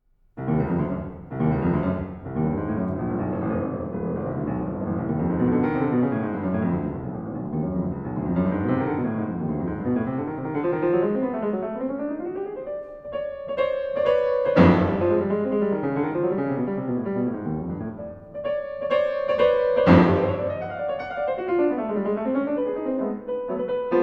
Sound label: Five Pieces for Piano Restless